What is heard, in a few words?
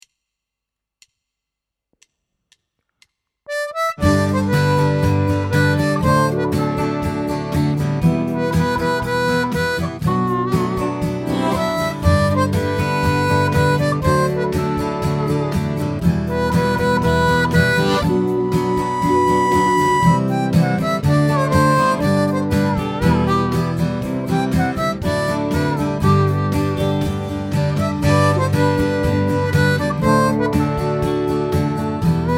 120 harp